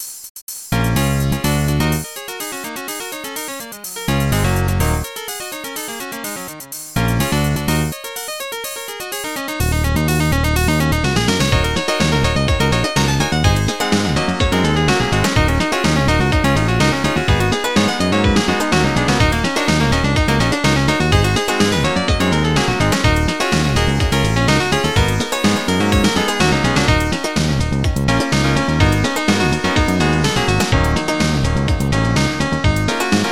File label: blues; Software Explosion; Fore-Matt Home Computing